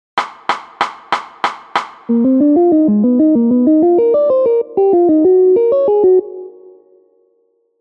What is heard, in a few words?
triad